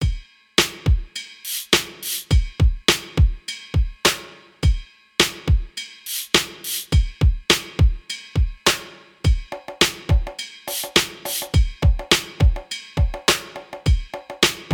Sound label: Groove